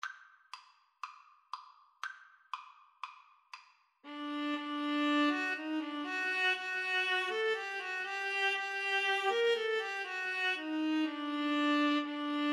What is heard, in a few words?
Viola Cello Duet